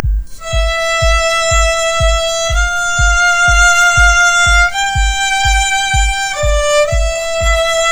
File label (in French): HPSS harmonique